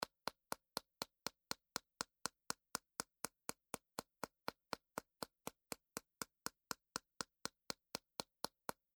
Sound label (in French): Ballon